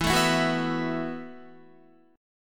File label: E Suspended 2nd Suspended 4th E, down, Guitar; Esus2sus4; strummed